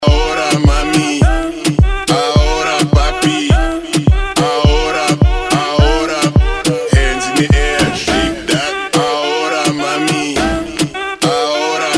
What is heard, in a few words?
Extended 105Bpm; DJDOLLS